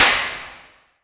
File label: clap; vortexhd